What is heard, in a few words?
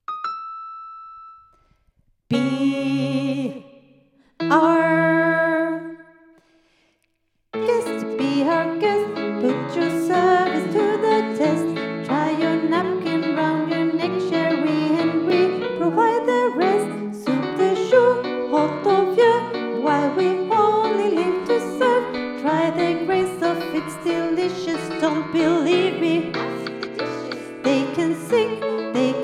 baritone